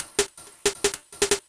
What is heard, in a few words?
HIHAT 160, Drum Bass; eJay